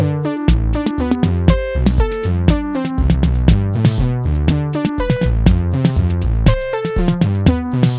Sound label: Electro; Pit and Temple E